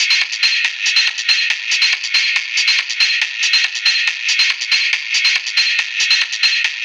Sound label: Metallic Perc, hard style